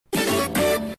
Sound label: tun tun tun